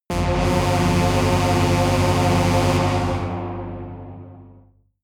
synthesized saw drone low

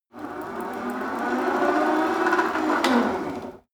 rope trainer pull sequence slow short; sport freizeit; fitnessstudio

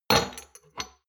shot glasses place multiple hard, gastronomie; berufe; soundpool

